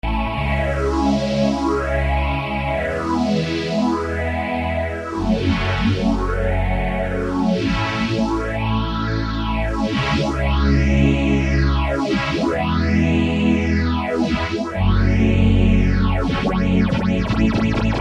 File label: Phaser mono